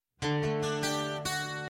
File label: Message Ring